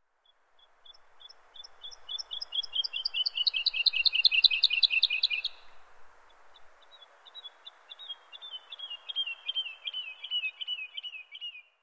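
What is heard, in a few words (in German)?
Heidelerchen, Vogelwelt Pyrenäen; vogelwelt pyrenaeen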